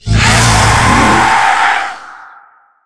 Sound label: spider striking